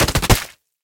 korotkaya pulemetnaya ochered

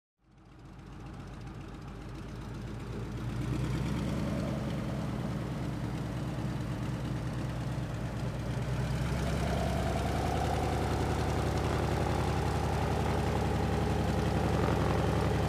odnovintovoj samolet ozhidaet glushitsya

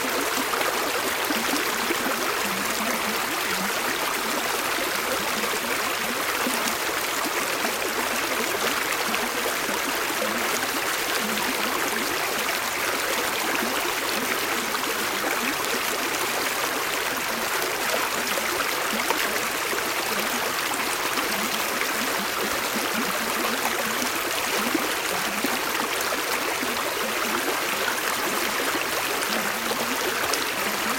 nebivaloj visoti vodopad na obrive

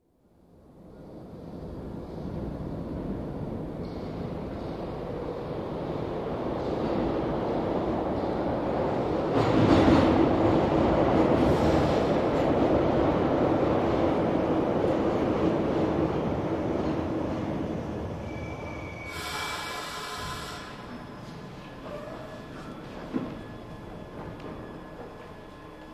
poezd ostanavlivaetsya v tonnele otkrivaet i zakrivaet dveri uezzhaet

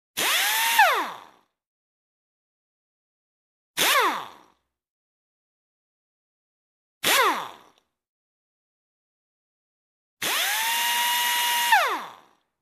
zvuki pnevmaticheskogo gajkoverta bez nagruzki

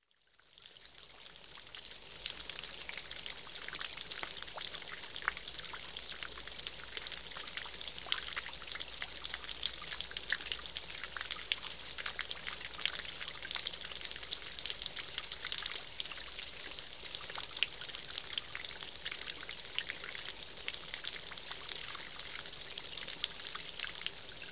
ochen malenkij vodopad

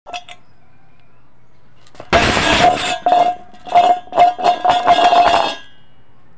zvuk podnyatogo metalla a zatem broshennogo ili broshennogo s zemli

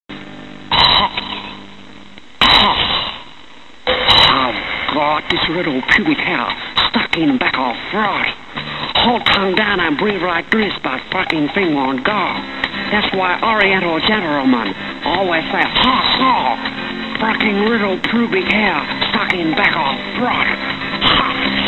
otkashlyalsya pered stihotvoreniem

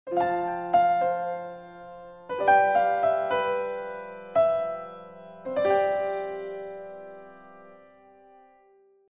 pianino myagkoe i priyatnoe i zvuchit zvuk perehoda

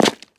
chyotkij korotkij zvuk igralnih kostej upavshih na stol

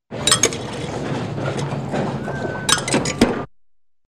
kassa v avtobuse opuskanie deneg zvon monet

